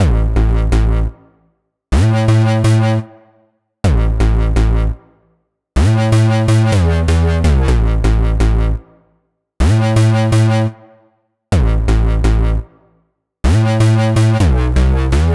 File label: 125 Am Bass, electro synths; Prime Loops; Complex Electro Synths; Ratio